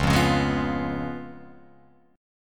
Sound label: Db 7th Db down Guitar Open F; Db7 strummed; JGuitar